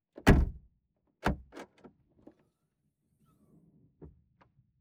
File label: Door Citroen C2 Trunk Open Close INT FRONT CENTER; MKH8040